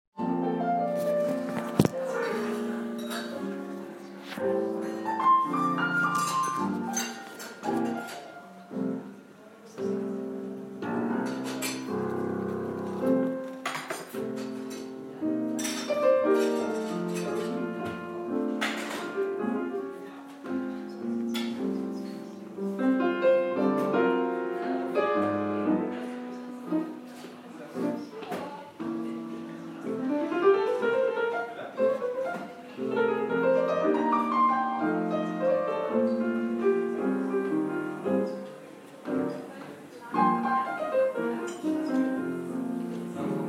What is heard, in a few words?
piano